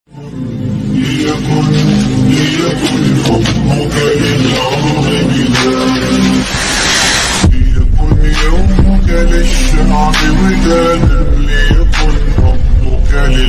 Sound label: islamic status arabic ringtone